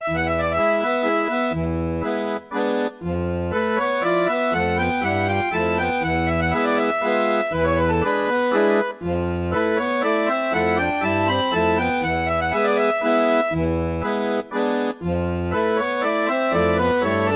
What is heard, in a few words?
Town Tavern